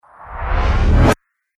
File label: Trailer Riser Abrupt End, Rise And Dive Sound Effects